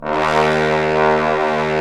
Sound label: BRS BSTBN, Bs.Bone Sect; Roland L-CDX-03 Disk 2; Bs.Trombones